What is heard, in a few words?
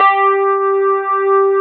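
Wurlitzer G4, Keyboards Old School; USB Soundscan vol.09; AKAI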